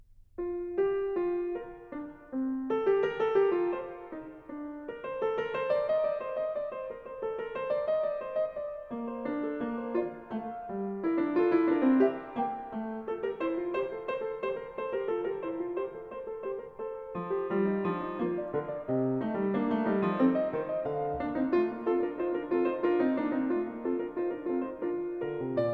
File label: Fugue, B flat major; BWV866; Bach; Well-Tempered Clavier; Book 1